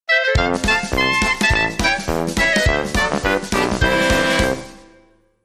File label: Polka sting